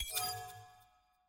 channel connect; vc team; premade voice

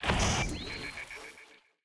fanfair; tent

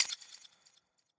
unmute button click; premade voice

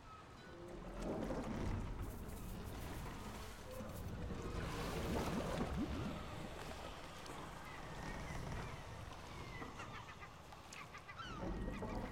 amb bilgewater docks

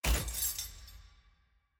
sfx ui collection artifact click; jayce

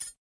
sfx ui champselect countdown single; jade

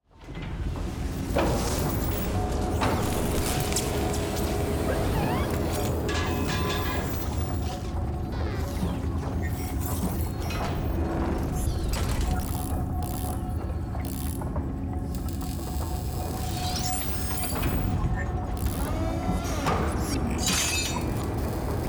music splash; heimer

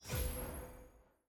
purchase button click

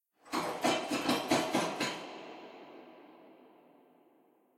walk run

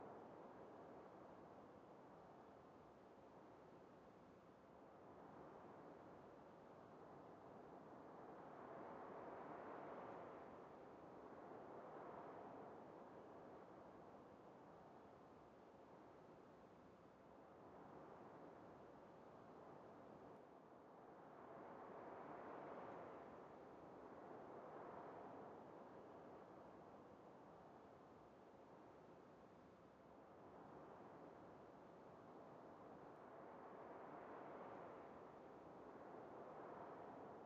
ambience loop; tft set3

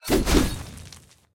attack; olaf

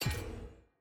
sfx ui archive locked; caitlyn

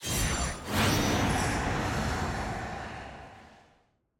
sfx skill; evelynn

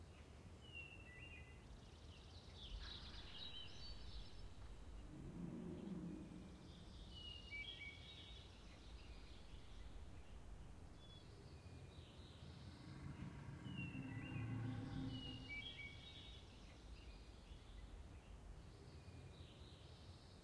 ambience loop; teamfighttactics; tft

